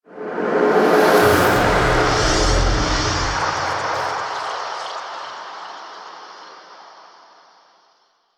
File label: Shrine Stinger A; Ropalolyst; Warframe; Revenant